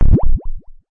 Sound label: kick, drums single hits; iElectribe Kicks